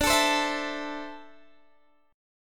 D 7th Suspended 2nd down Guitar DADGAD; D7sus2; strummed

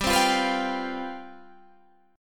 C Major 7th Suspended 4th, G, guitar chord

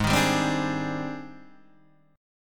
Ab Major Sharp 11th Ab, Guitar DADGAD

